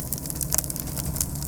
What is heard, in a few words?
Fire Loop